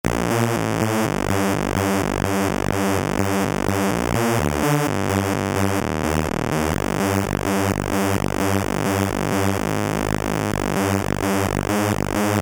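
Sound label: Uni Saw Lead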